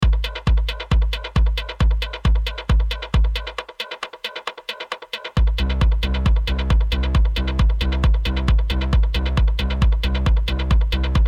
acid phase, dreckiger bass

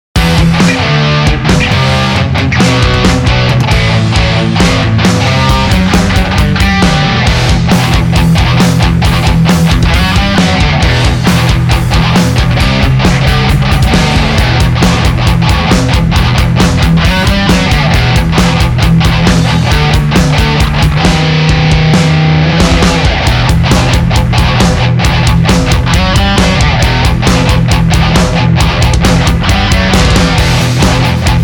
metal soundcheck

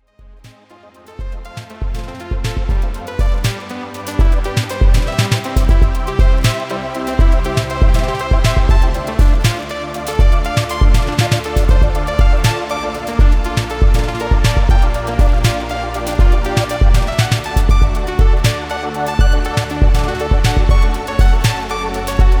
spring groove

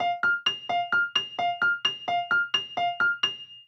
piano original

